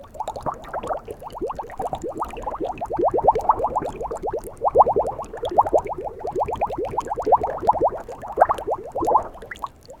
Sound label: very slow bubbles gentle; zen